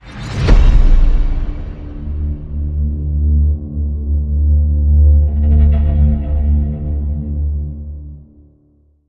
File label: Hit Tonal The Raven